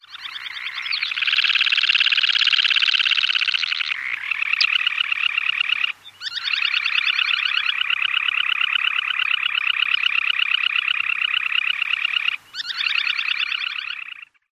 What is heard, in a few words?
display song